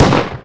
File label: ATTACK GUN H